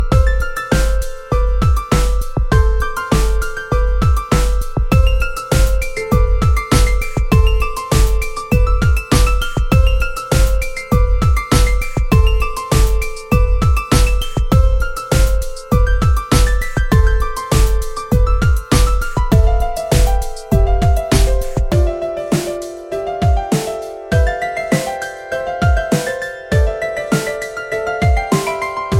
ring all

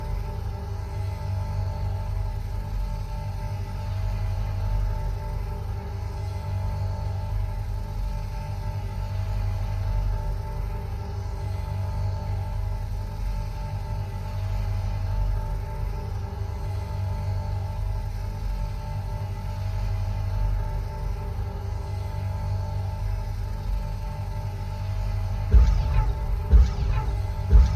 shield bubble

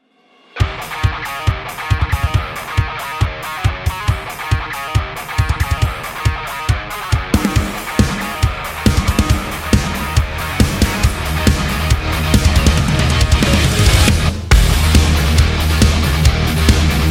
Metal

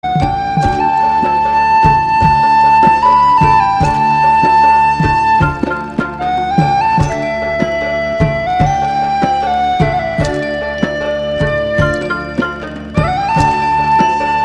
Bengali Folk

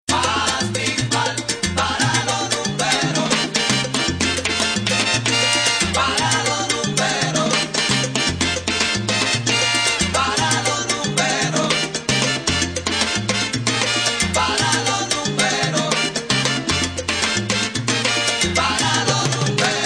Timbal

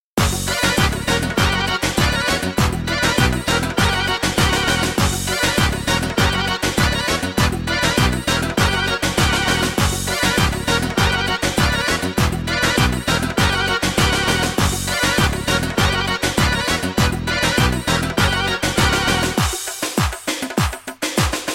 Balkan Turbo Folk

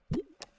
Blip; Astroneer

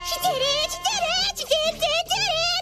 cheer; Mako; Ryuko

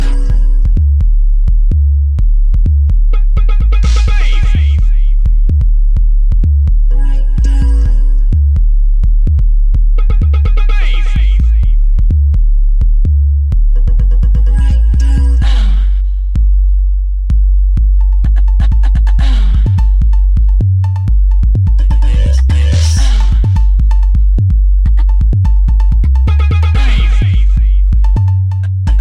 Bass Woofer

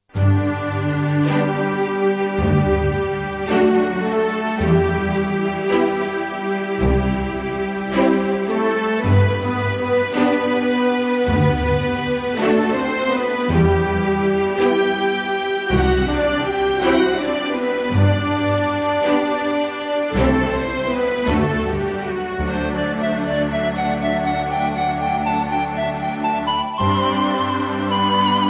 Okarina

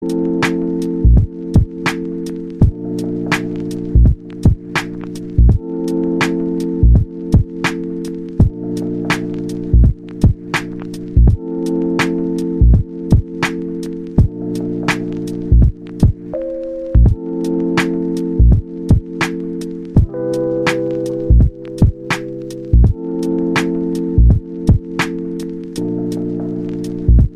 drum loop

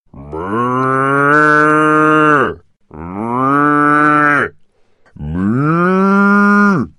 Cow Moo

